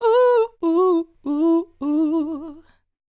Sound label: Ad Lib Dry, 100 BPM D Maj, Vocal; Cymatics; Euphoria Vocal Sample Pack; Ad Libs